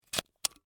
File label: Nerf Pistol Load, Foley, Pistol, Load, Dart, Toy, Gun; Toys; BlastWave